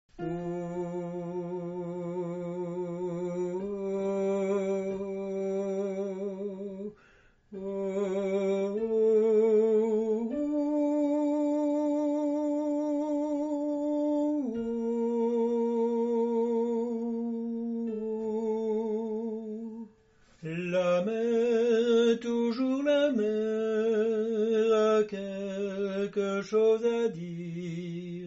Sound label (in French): soprano; Soprano tutti